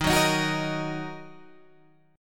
D sharp Minor D sharp, Guitar Open G; 13,11,13,11,11,13; chordshape

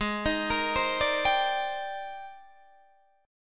G sharp Major Sharp 11th, Guitar DADGAD; arpeggiated; G#M#11; 18,18,20,17,17,17

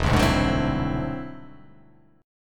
E Augmented 9th E down; E+9 strummed; JGuitar

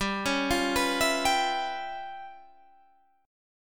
Db 7th Sharp 9th Flat 5th G

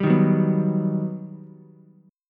G Augmented Major 7th, Ukulele; G+M7 strummed; G+M7 chord; JGuitar

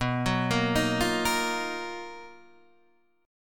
B Major Sharp 11th, Guitar, Open D

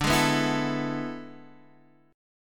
E 11th D

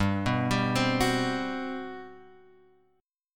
G Major 11th; GM11 Chord {3 2 5 5 X 2} arpeggiated

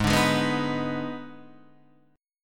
Eb Major 7th Suspended 4th Sharp 5th Ab

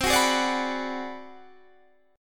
Ab 7th Sharp 9th Flat 5th, chord

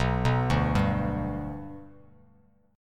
B Minor Sharp 5th B Bass Standard, chord; arpeggiated; JGuitar

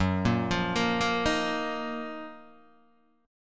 F sharp Major 13th, Guitar, Open D

